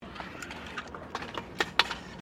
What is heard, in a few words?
Bladescooter Skate Bowl Drop, Scooter in a skate bowl; Miscellaneous Sports